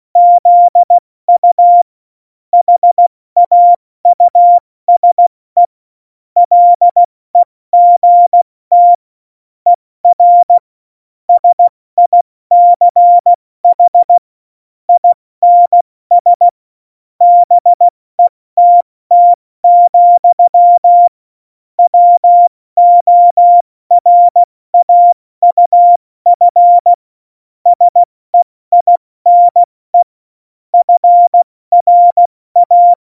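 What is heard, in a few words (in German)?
Witz, Tempo 70, Morsekurs, cw morsen; Witze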